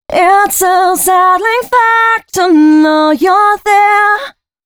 female c# 138, hooklines 136-140 BPM

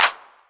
Clap, Novation Drumstation

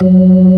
MID FAST F#3, ToneWheel Organ; E-MU Formula 4000 Series Vol. 3; Analog Odyssey

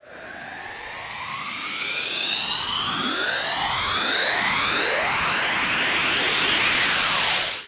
reverse; Techno Trance Essentials; FX & ATMO

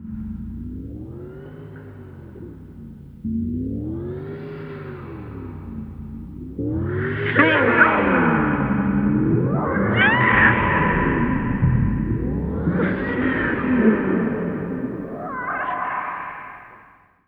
Trampoline Accident; Zero-G - Ghost In The Machine

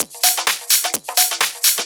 Discohouse Loop 128 BPM

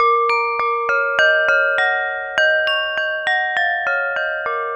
Corning Bowl; Ambient; Melodic; Ambient-Melodics

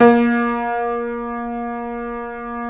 A#2 R, Keyboards Acoustic; USB Soundscan; AKAI

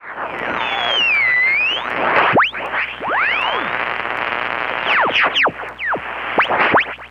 Radio Tuning, Short Wave; Sonic Foundry; The Electronic Music Manuscript Collection; EVP Short Wave Recordings

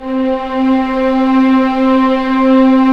Vcs p Orch, String Sections; Roland LCDP13

